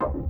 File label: perc, 100bpm; algorave